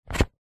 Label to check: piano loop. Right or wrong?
Wrong